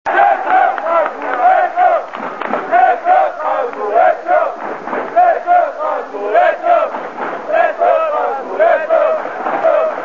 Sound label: lets go strasbourg, Chants